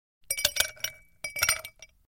Ice Clinks in Glass Without Liquid; Household

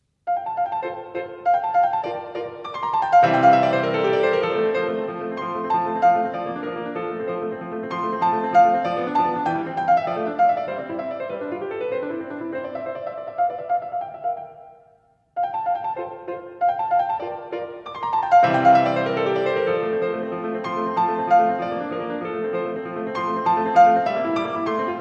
Sonatina, Sonatinas For Piano

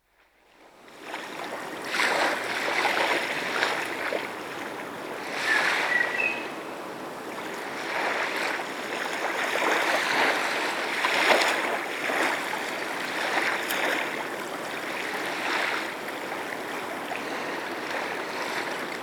Gentle Waves Lapping the Shoreline; Dr. Sound Effects; 210 Nature Sounds - 20 Hours of Relaxing Natural Ambiences for Meditation and Sleep

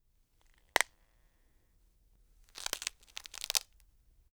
Knuckle Crack Hand 2 Variations; Foley; Foley Essentials; Foley - Misc

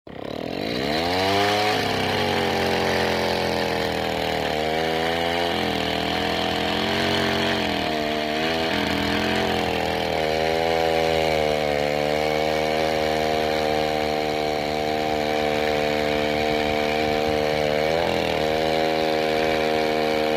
Two-cycle Motor; SOUND EFFECTS1

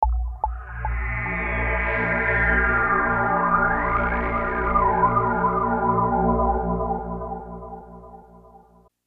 Bass A#; Beds

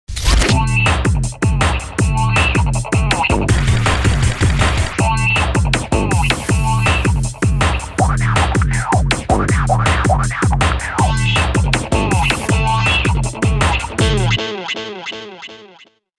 Running Guitar Groove; DJ sounds; Mtrax

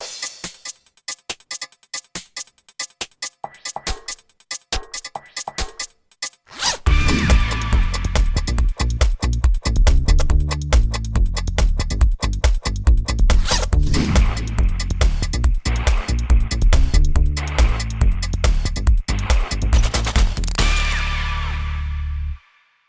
Plastic Bags

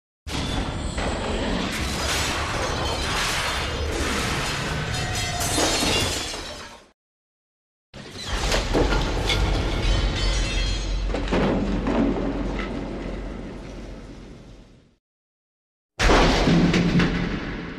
Metal Crashes Distant Large Distant Metal Impact; Hollywood Edge Foley Sound Library

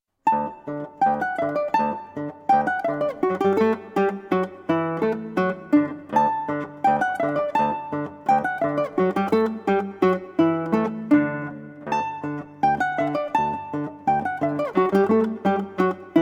Guitar and Ukulele; Musette (BWV Anhang 126); JS Bach

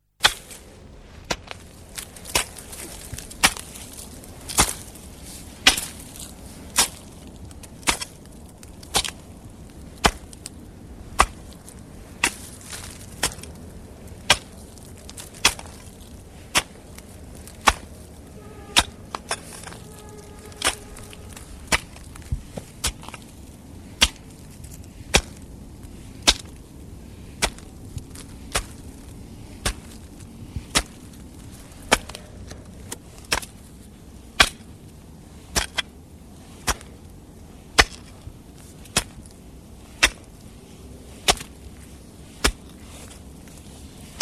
Hoeing; SOUND EFFECTS1

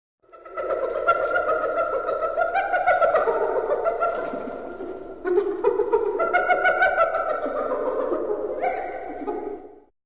Hyena; SOUND EFFECTS1

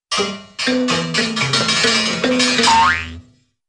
Springs Popping Out Of Bed Or Sofa, Cartoon; Hollywood Edge Cartoon Trax

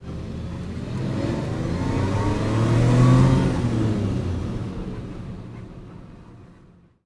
run, cars; 1000fx

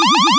laser beam, space

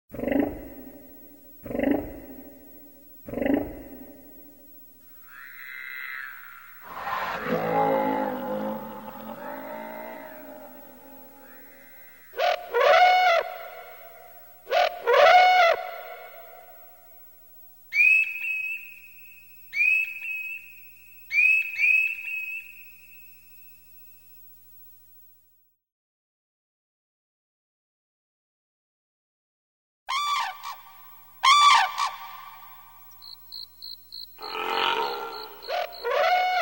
animal sounds; SOUND EFFECTS1

